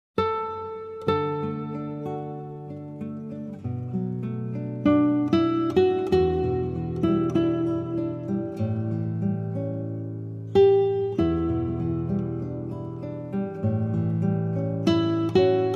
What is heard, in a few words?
acoustic praise, instrumental